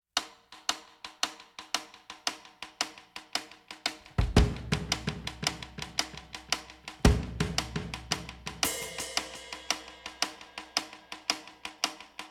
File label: drums